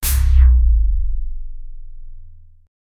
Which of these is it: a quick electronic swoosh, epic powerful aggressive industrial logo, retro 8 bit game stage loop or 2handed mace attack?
a quick electronic swoosh